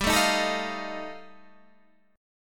G 7th Suspended 2nd Sharp 5th G chord

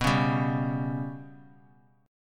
B Suspended 2nd Sharp 5th; Bsus2#5 strummed; JGuitar

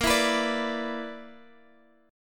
B-Minor Major 7th Double Flat 5th

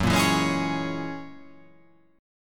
F sharp 9th F sharp, chord

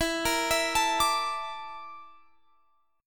Bb Major 7th Flat 5th

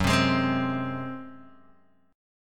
Gb Suspended 2nd Flat 5th Guitar Open D

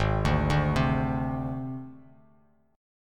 Gsharp Major Flat 5th, Bass Standard; arpeggiated; 4,5,0,5

